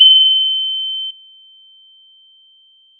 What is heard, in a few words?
pop, Sound of a church bell